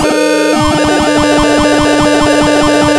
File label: pop, tearing paper